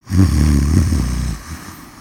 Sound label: Pet Doberman Snore